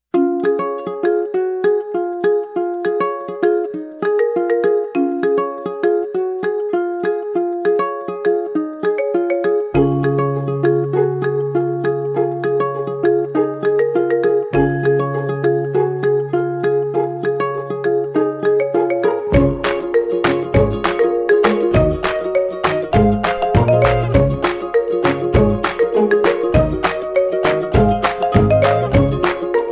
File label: Double Times Step Routine Music